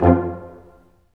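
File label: BRS, Brass Ensemble stac; Roland L-CD702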